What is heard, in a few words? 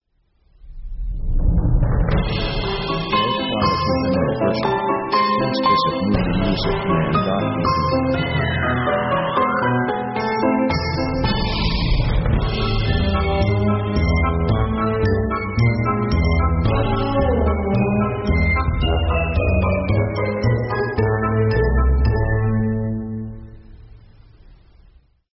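funny comic orchestral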